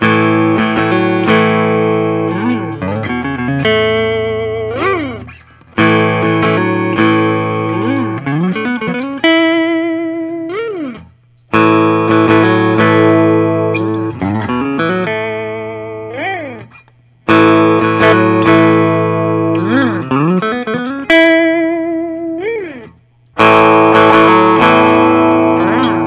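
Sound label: Collings I 35 Deluxe Madagascar; dark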